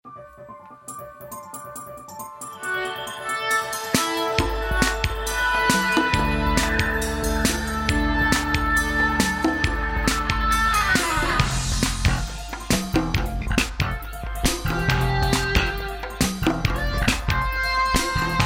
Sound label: Chiptune Genesis; Sonic CD